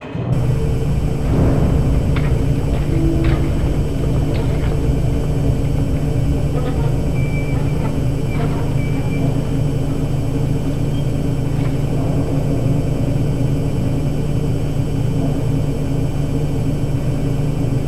SFX Presidential Elevator

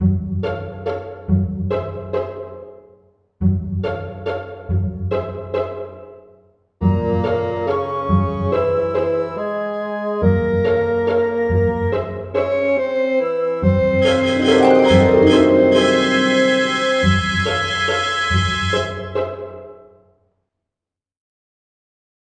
Lounge

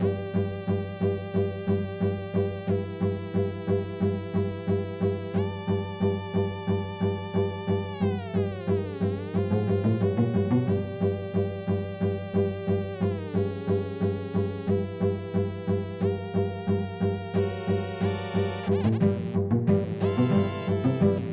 Tense Combo

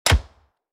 gun shot with barrel; out of reach treasure royale 2020 gamerip pc

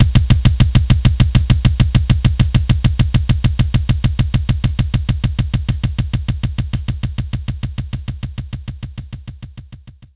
kick

